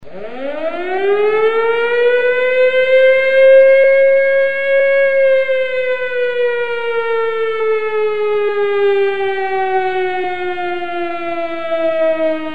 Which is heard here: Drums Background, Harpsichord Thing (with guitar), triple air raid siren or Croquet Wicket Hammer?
triple air raid siren